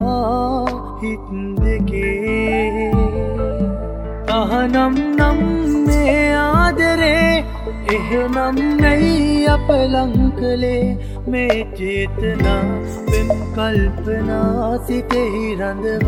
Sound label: sri lankan song ringtone